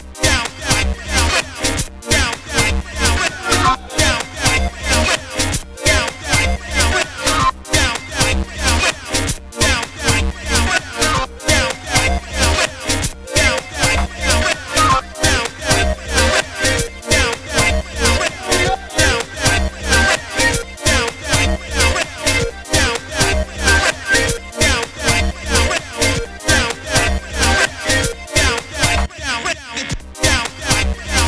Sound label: Pause Menu; The Workbench; Friday Night Funkin' Funkin' Origins OST mod gamerip PC 2022